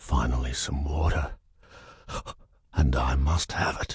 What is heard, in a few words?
water; Bascileus; Kreos